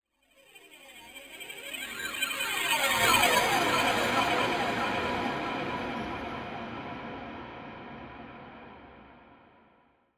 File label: TECHNO FX 140